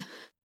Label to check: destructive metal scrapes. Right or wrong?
Wrong